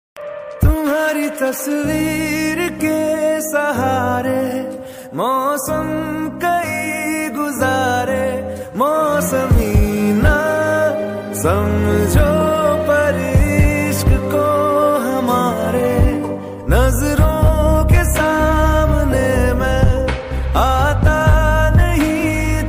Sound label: Sad Hindi Song Ringtone